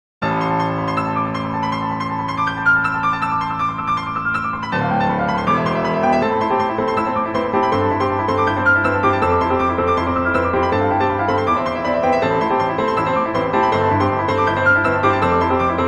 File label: piano